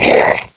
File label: Attack; KF Christmas; Patriarch